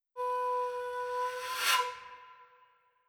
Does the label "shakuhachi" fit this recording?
Yes